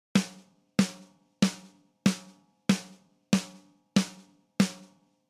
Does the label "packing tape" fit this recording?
No